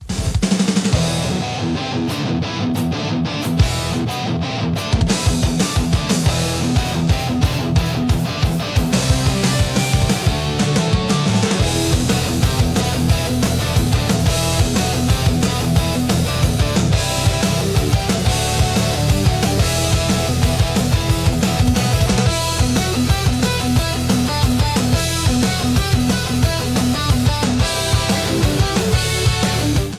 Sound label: riff explosion